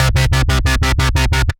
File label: Bass; Ableton; Factory Packs; Build and Drop